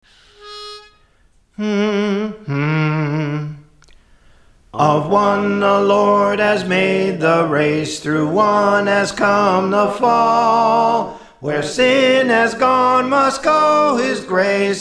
Soprano; The Gospel Is for All; Praise For The Lord Training

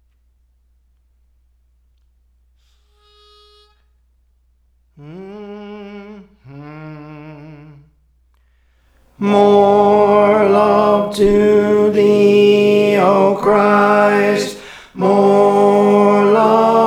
Tenor, Songs; Praise For The Lord Training; More Love to Thee O Christ

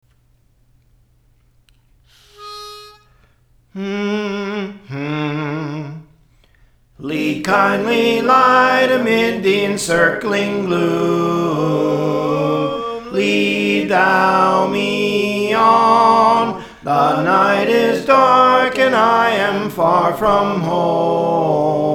Soprano; Praise For The Lord Training; Lead Kindly Light

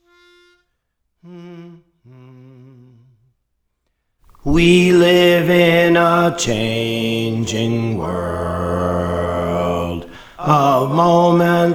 Bass; Praise For The Lord Training

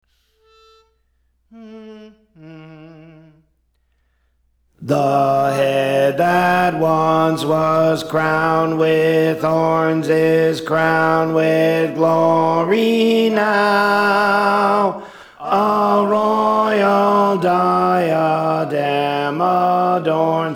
Alto; The Head That Once Was Crowned; Praise For The Lord Training

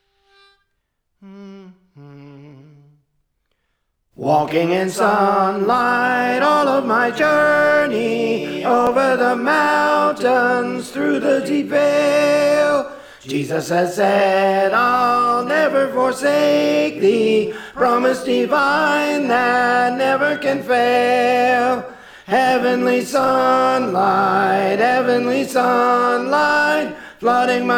Tenor; Walking in Sunlight; Praise For The Lord Training

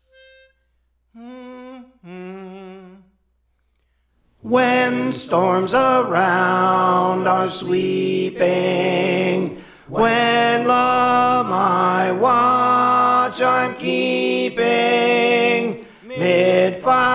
Tenor; Praise For The Lord Training

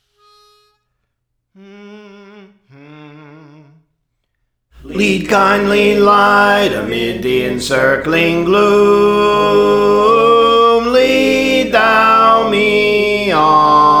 Bass; Praise For The Lord Training; Lead Kindly Light

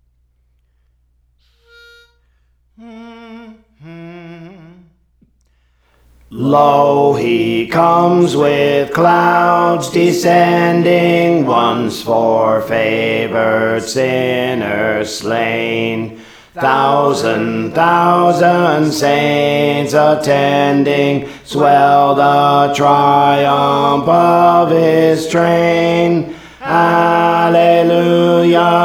Alto; Praise For The Lord Training; Lo He Comes with Clouds Descending

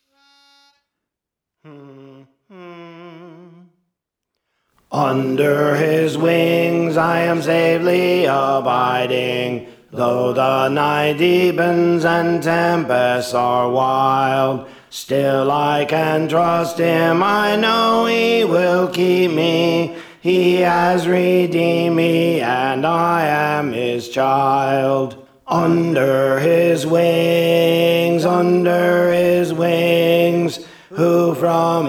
Alto; Under His Wings; Praise For The Lord Training

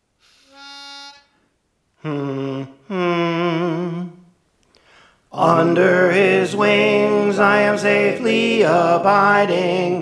Soprano; Under His Wings; Praise For The Lord Training

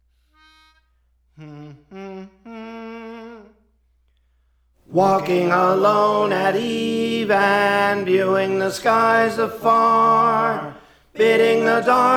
Tenor; Praise For The Lord Training

